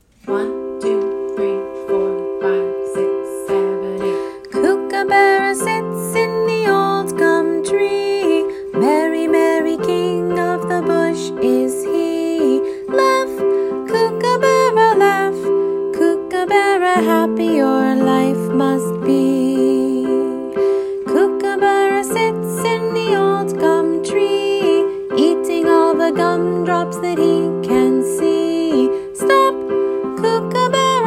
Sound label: Kookaburra